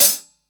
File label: focused hat sample B key